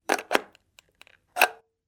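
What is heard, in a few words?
socket making sounds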